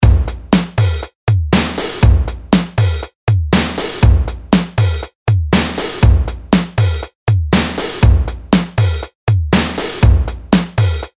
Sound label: drum hits